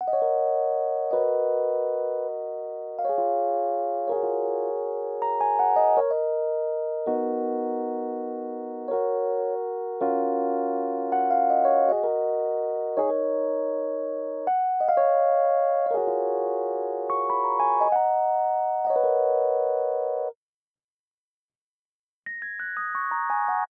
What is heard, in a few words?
rhodes, Smooth RnB, Ebmin; Big Fish Audio; Legendary; Happiness